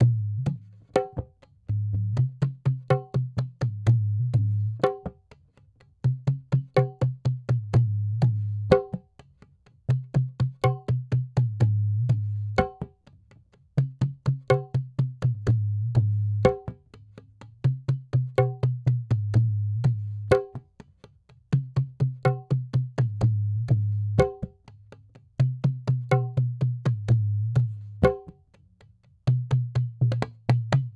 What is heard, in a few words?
dholak 062, Hip Hop India; Hip Hop India Modern Percussion; Apple Loops